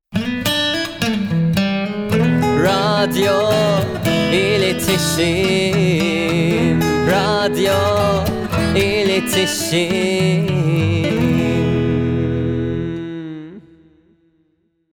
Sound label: jingle